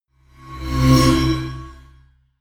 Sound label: sweep